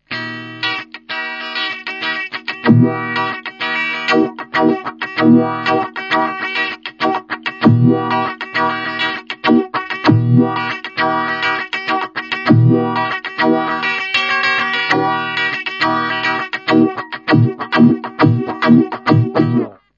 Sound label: meatball; psst